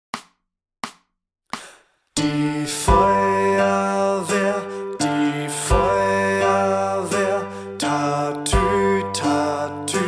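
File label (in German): Die Feuerwehr langsam, Gitarrenschule; Titel 28 – Die Feuerwehr (langsam)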